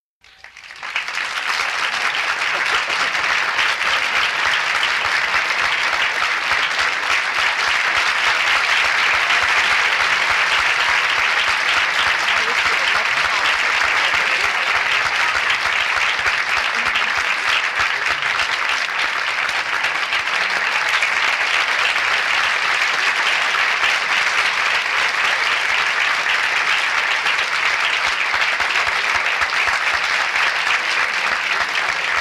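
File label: Concert Crowd Long App, concert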